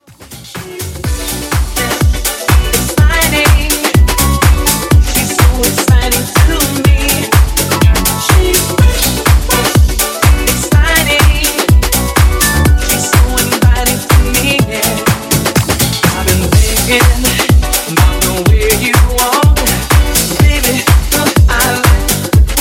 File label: Funky House Intro; Remixfreakz